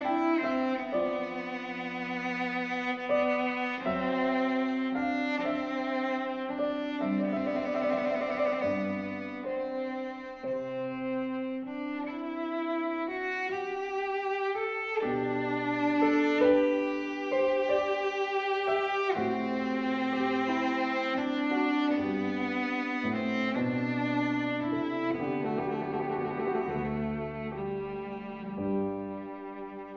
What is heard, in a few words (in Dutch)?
Adagio Sonate in C groot voor cello en piano; Handel